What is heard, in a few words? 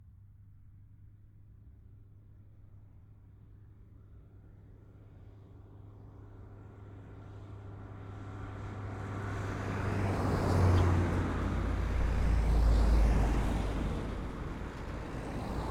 Cars, Vehicles, traffic, road, rural, wave, moderate speed; MKH8040; Pass-by; Sound Sower